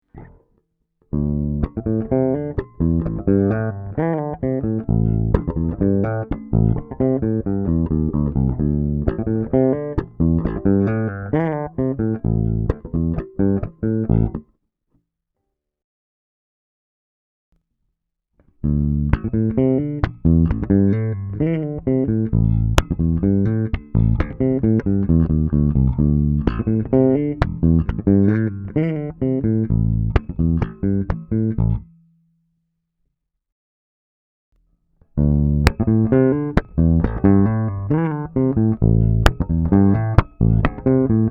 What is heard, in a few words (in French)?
basse